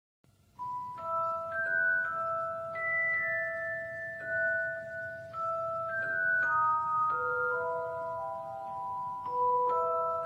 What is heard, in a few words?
music